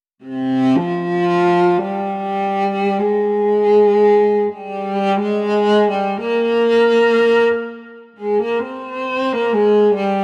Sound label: valse cello